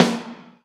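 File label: snare low lo-fi, drum machines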